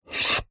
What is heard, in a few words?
knife tip scrape metal surface sharp; zapsplat